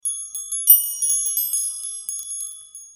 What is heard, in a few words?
Holiday Chimes Alert